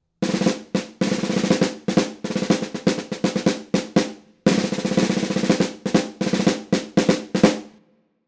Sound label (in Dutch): snare